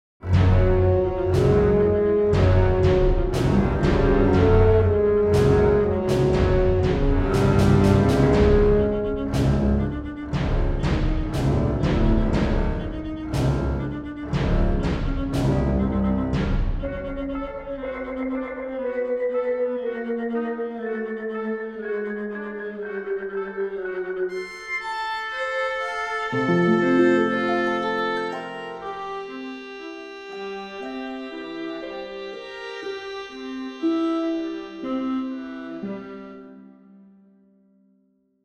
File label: test orchestra